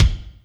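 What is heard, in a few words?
kick Chunky RM, Alesis D4 drum machine; 300 Drum Machines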